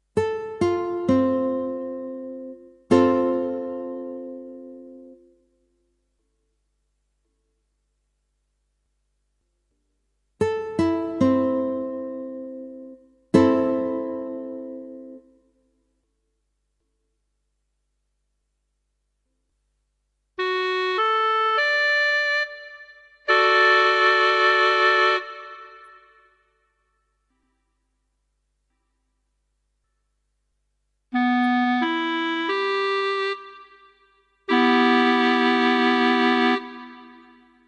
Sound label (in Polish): akordy